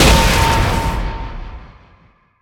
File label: arcane hit initial; smite; god merlin